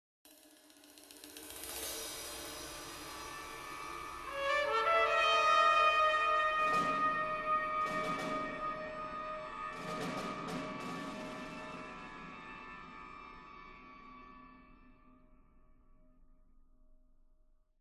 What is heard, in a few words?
Ambient